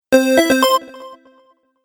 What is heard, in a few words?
Stage Level Complete, Video Game Sound Effects